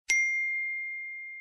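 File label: notification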